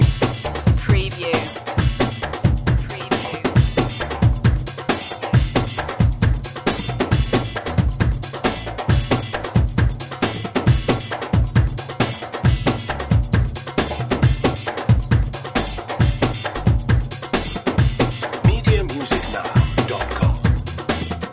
dance party oriental loop

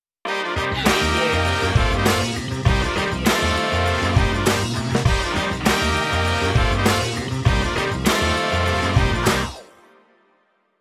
funkin yell stinger

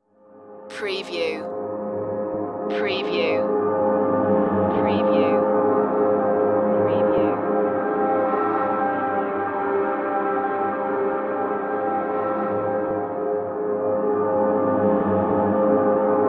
screaming void

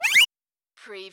CARTOON ZIP

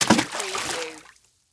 Splash Object Drop

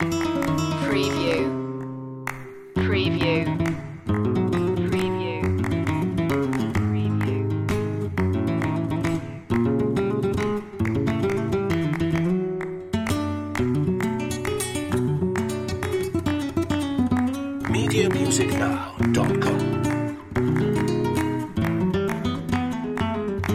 solea por buleria loop

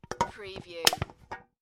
Empty Drinks Can Crush; beanstalk audio

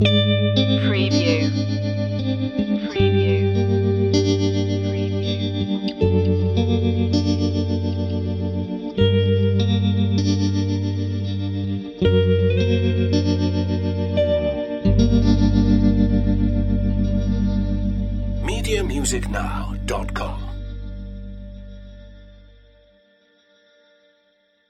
spooky guitar pick loop; Soundtrack / Dark / Emotive

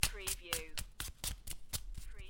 Playing Cards Shuffling Cards In Hand

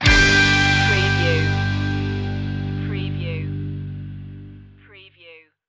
smile stinger

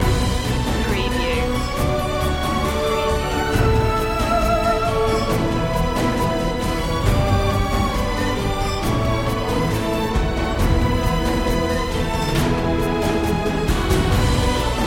full mix

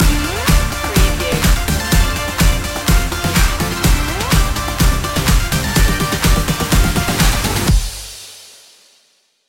house party stinger

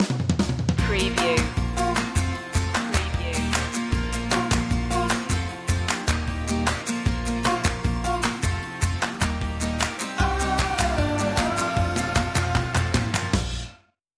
springing indie

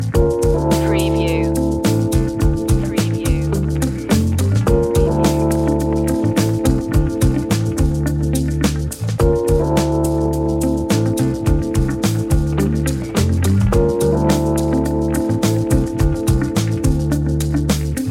acid jazz loop

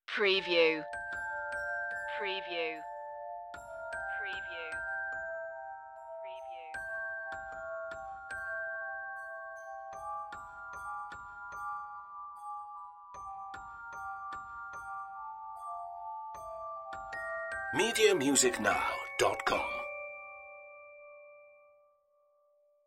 melody only

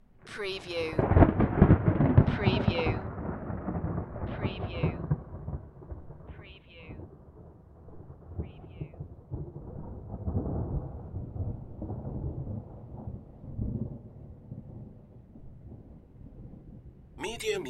Thunder Medium Thunder Crack Long Tail

